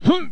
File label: jump; PC Gamer IT CD 45; SEED demo; hero